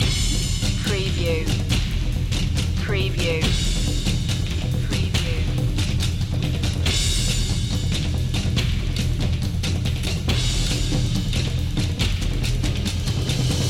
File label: tribal march percussion loop